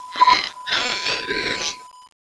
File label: child attack